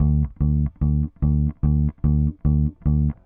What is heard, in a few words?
Bass, Indie Rock